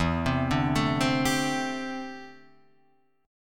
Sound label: D 7th Suspended 2nd Suspended 4th E